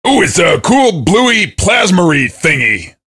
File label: cheer; svencoop; voice commands; SeriousSam